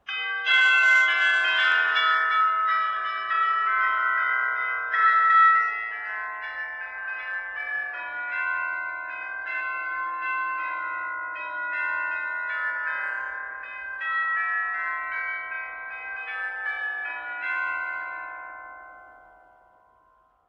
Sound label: Chime